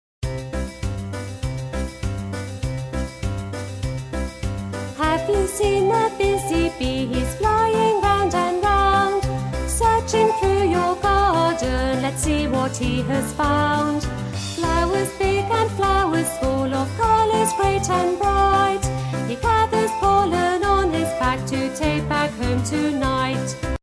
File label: Busy Bee